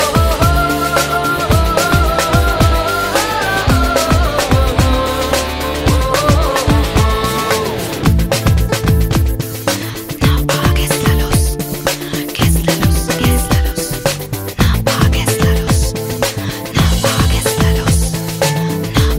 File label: telugu music ringtone